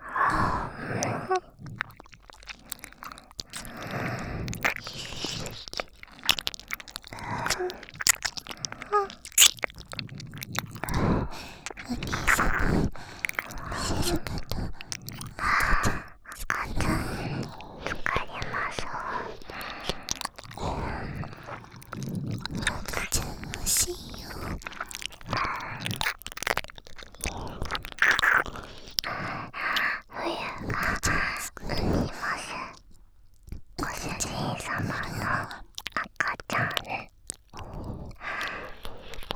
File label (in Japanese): 耳舐め; 赤ちゃん作ろ♪